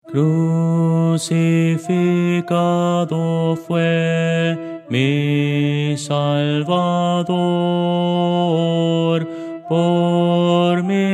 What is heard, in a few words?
contralto